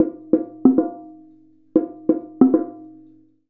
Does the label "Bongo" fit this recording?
Yes